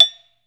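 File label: SARAN F4, Metalophones, Pitched Percussion; E-MU Producer Series Vol. 7 – Old World Instruments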